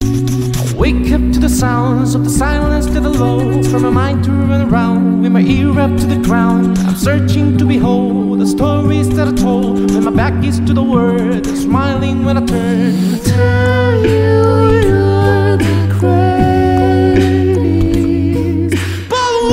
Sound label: Theme Acapella; ARCANE